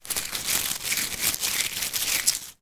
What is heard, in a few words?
leisure decorative party plastic ribbon movement in hand